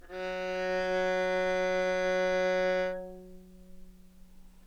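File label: baroque viola G string; OPENAIR; Anechoic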